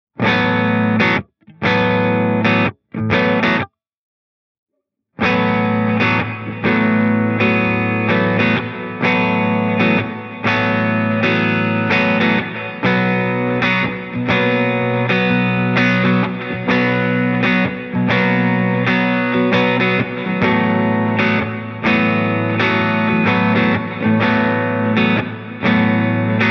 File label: Delay Reverb Plate